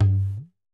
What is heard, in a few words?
tom, Roland MC-909